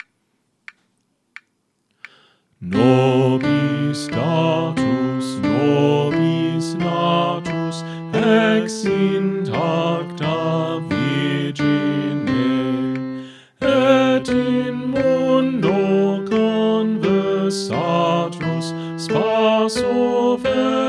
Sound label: Soprano; Pange, Lingua, Gloriosi; St. Columba Choir Practice Tracks